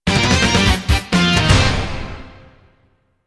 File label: Game Over; Zoids Full Metal Crash